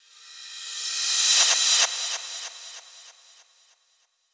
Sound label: ReCrash; FLPRO